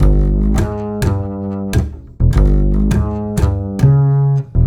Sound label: Acoustic Bass; Sonic Foundry (Sony Creative Software); Leo Cavallo Bass Taster